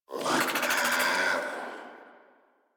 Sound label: Infested Charger Idle, Enemies; Warframe